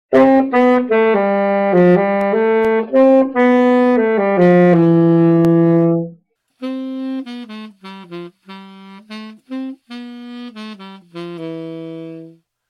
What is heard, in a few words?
saxophone